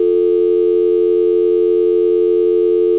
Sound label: Tone; Hame-Dori Hunter Syatta